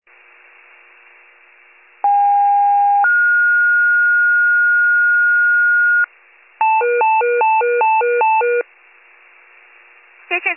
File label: FIRE pager alert